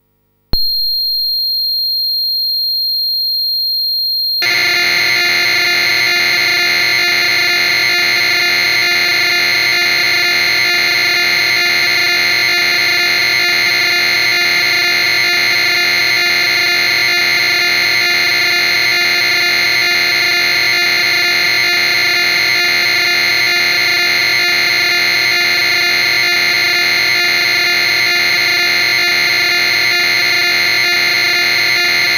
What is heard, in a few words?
PPG Wave; Clean; MyWave